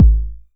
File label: 909 BD; Goldbaby Tape808 & Tape909; The Tape 909